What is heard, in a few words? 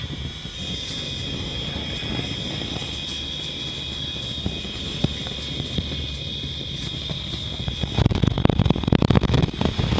fire; Звук походного костра